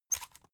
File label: salt mill squeak close